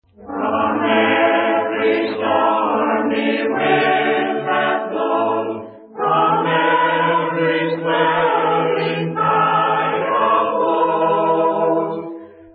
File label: a capella hymn; from every stormy wind that blows